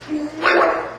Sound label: dying bird; ff hunted